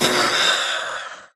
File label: death, phantom; mob; Minecraft